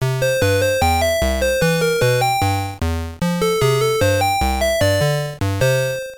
stage clear; popeye

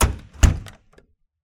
closing door

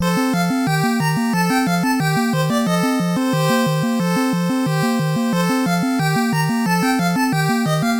airship theme; final-fantasy

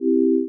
pad, retro synth; agentmag

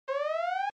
alert notification; command-conquer

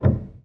piano

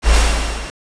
ally fire attack

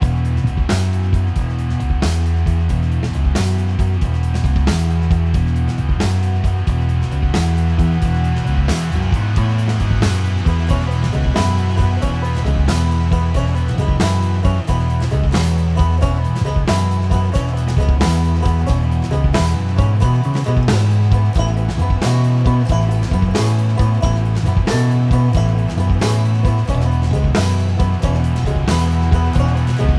music

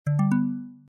drill up

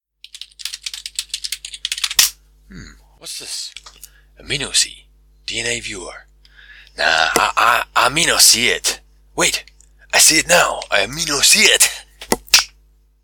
audio logo; aminosee

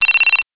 ring

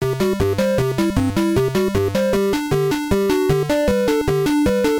bonus; simpsons

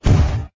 common fire

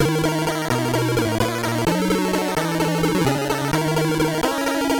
boss battle; dracula

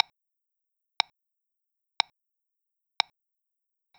metronome 1sec

